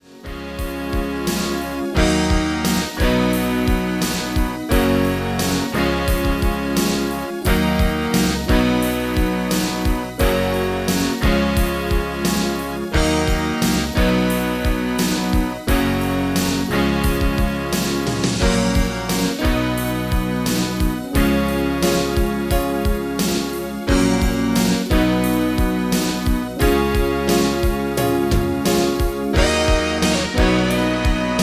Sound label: MIDI